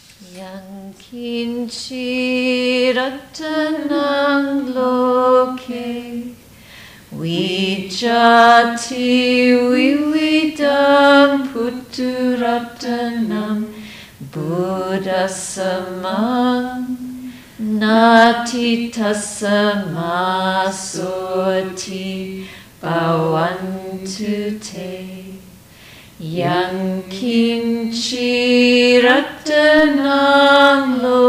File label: Chant